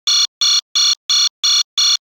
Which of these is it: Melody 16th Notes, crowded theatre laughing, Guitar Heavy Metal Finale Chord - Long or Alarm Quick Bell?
Alarm Quick Bell